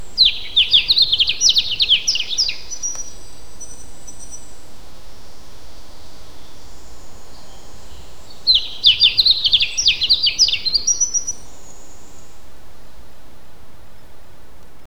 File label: the cry of an exotic, bird cry